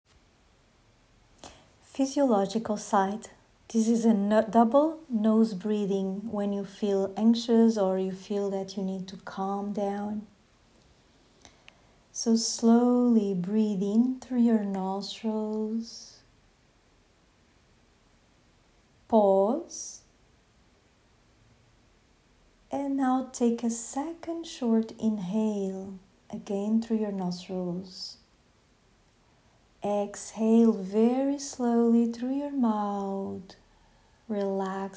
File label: Physiological sigh breath (to calm down)